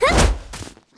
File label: dash; Archemist F